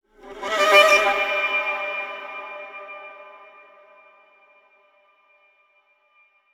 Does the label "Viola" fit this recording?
Yes